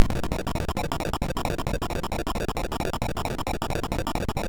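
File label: Mountain King, MOS 6581 SID chip music, games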